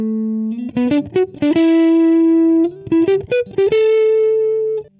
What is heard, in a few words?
latino